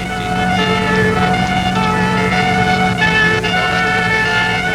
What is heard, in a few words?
Siren Loop; Sound FX